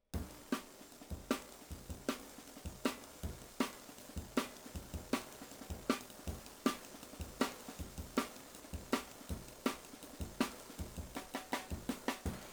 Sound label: OH RIDE, drum n bass; JUNGLE BEATS; THE RAIN RIDE CYMBAL; RAW MULTITRACKS